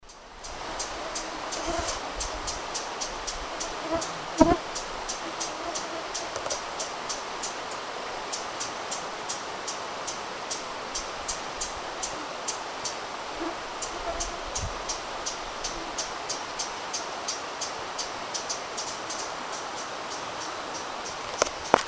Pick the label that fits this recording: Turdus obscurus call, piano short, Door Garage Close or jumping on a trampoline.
Turdus obscurus call